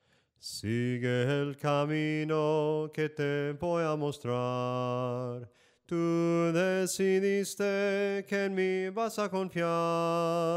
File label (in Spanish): SOPRANO CANTADO, himnos en melodia soprano